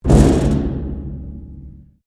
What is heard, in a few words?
Strike Metal Hollow Tank Rattle; Materials